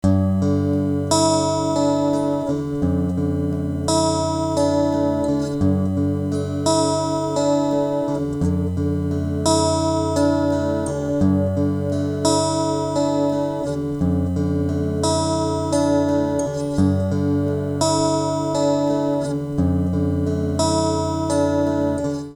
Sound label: takamine